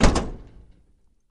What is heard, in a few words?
Camaro Door Close Hea, chevy camaro